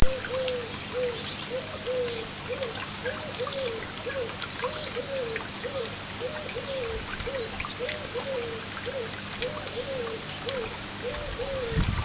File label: garden fountain and birds